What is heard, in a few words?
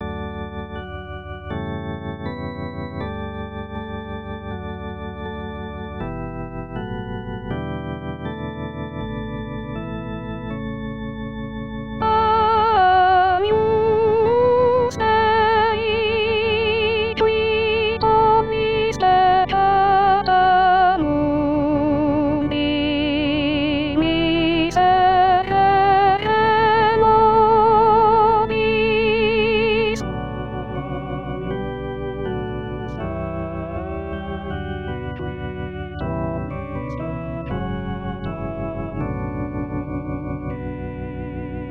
Agnus Dei Soprani; Visconti